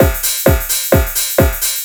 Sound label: Dance Drumloop; Drums; Dance Beats